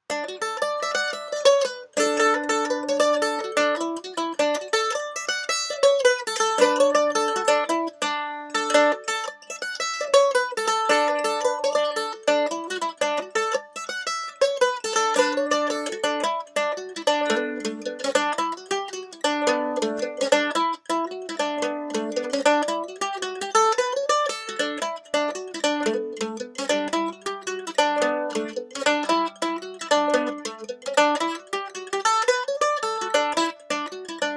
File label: Mandolin Pick Comparison